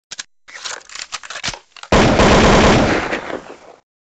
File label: ak 47 gun sound effect